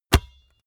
basketball catch hard heavy

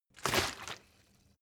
bottle water sparse shake single; berufe

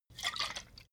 bottle vodka shake single; berufe

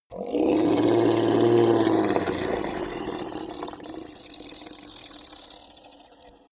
hellhound roar growl; kreaturen

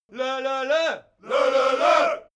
crowd musical bawling

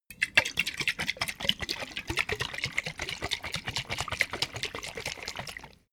bottle vodka shake fast; berufe; gastronomie

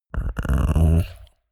chihuahua growl; natur-tiere; hunde

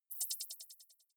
click decay high

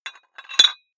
dumbbell put on very small weight hit

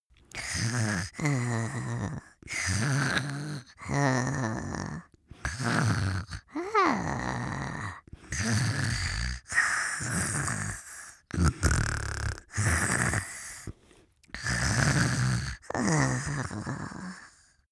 goblin sleep snore, comedy cartoon; soundpool

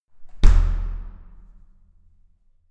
entrance door close extremely hard

